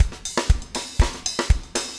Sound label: 4 on floor 120, loops, 120bpm